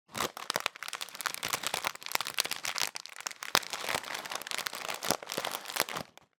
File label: food crisps potato chips packet very light movement handling